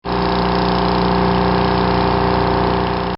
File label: Generator Portable Electric; Electrical; Bobby Cole